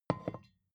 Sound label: vodka put down tipping